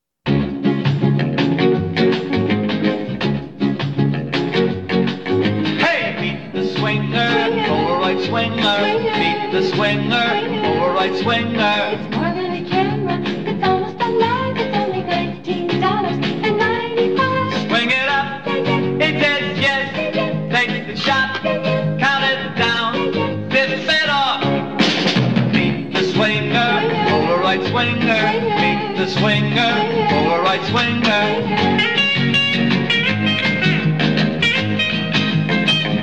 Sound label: Jingle